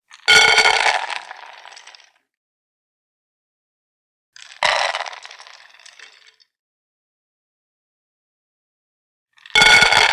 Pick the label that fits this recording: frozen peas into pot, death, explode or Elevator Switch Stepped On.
frozen peas into pot